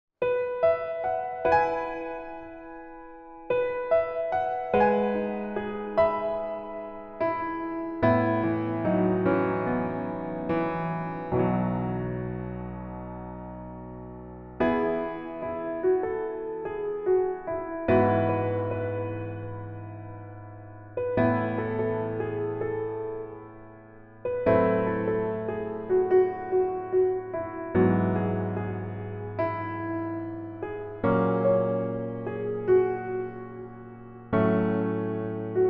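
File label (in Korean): Piano version 멜로디 MR 남자키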